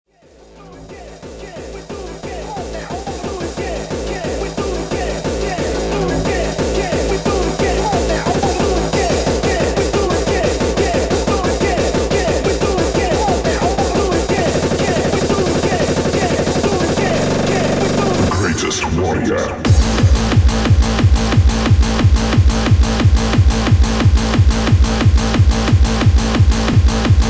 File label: speaker bass